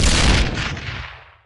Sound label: explosion cluster